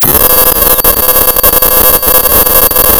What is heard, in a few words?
Sound of tearing paper, center